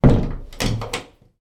household toilet cubicle door close and lock

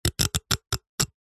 comb metal fingers run through teeth; zapsplat

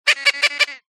parrot lilac crowned amazon single call; animals birds; zapsplat

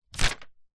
foley paper sheet a4 grab pick up hard in anger

